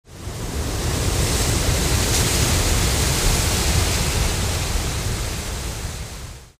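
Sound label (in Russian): шорох листьев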